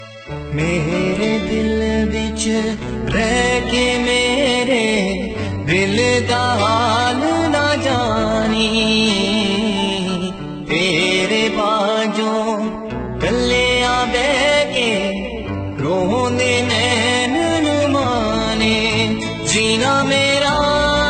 Sad Song Ringtone

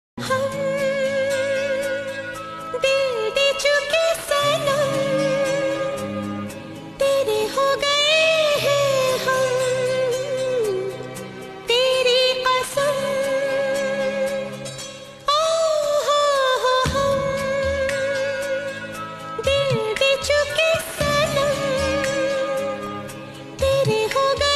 Song Female Version Ringtone

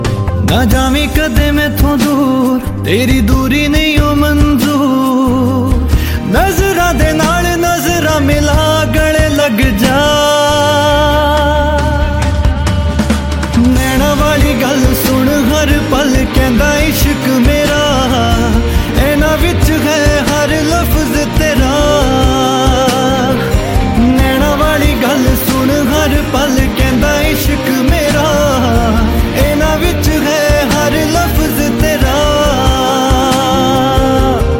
Sad Ringtone

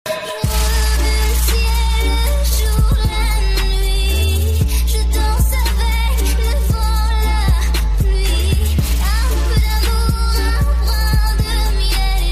Remix Ringtone

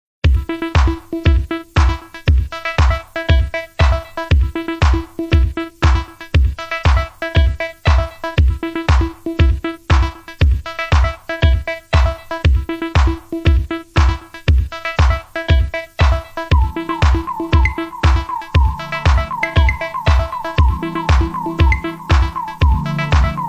BGM Music Ringtone

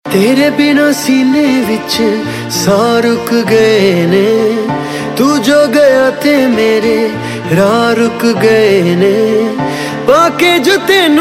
Punjabi Ringtone

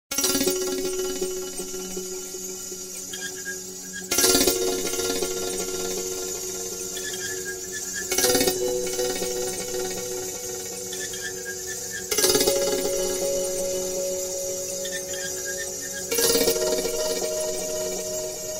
3D Ringtone; ringtones

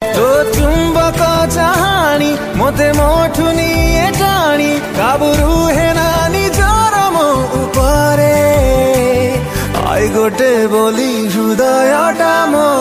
Odia Ringtone

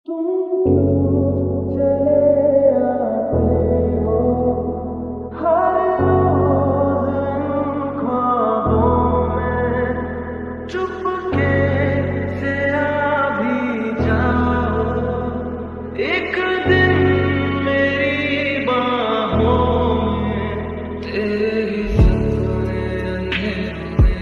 Sad Ringtone

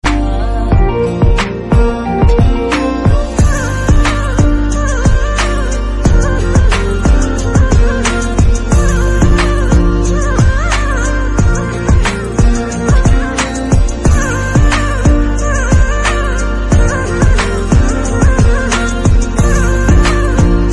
Music From East Nepal Ringtone; ringtones

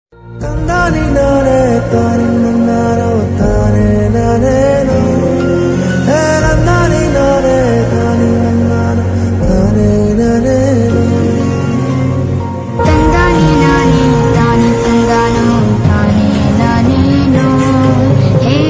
Heart Touching Ringtone; KGF

